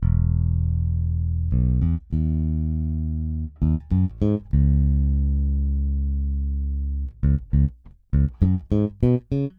Gospel B 100bpm bass; Talk To Me Gospel 3

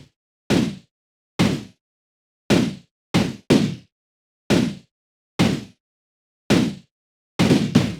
Snare Trash 120

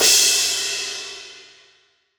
CYMBAL

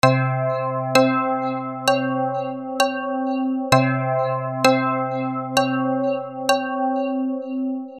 SONGSTARTER E; KULTURE

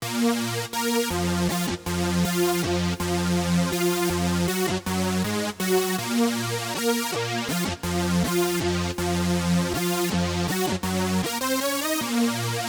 LEAD

